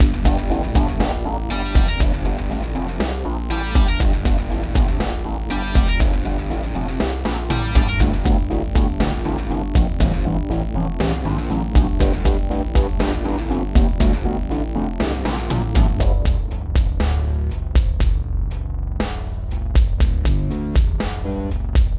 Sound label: hot road loop